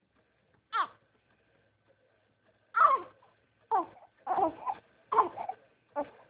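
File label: baby hungry, female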